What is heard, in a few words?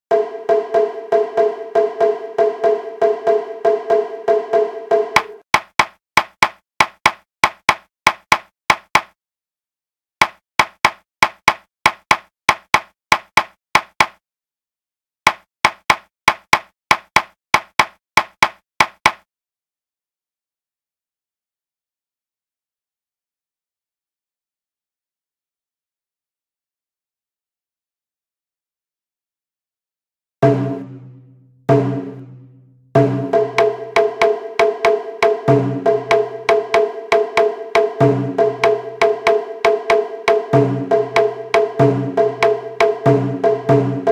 hiryu sandan gaeshi group a shime 95bpm